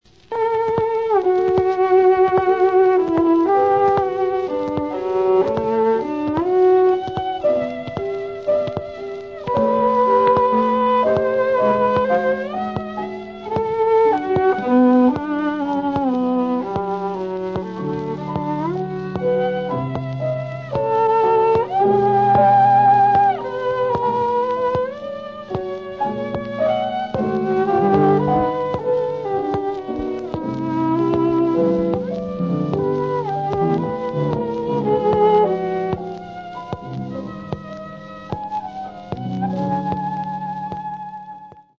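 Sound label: three pieces violin piano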